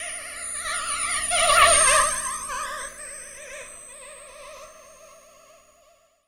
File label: ELEC EEL, Anime Sound FX FLYOBJECTS; AKAI Anime Sound FX